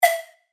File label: percussion; Oyster; revealed percussion vol 3